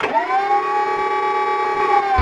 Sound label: pain; alienarena; war